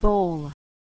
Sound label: bowl